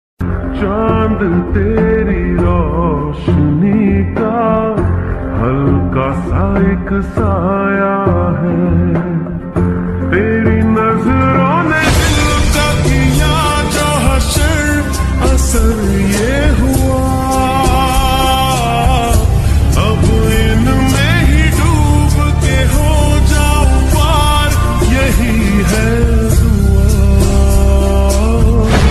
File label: slow version hindi ringtone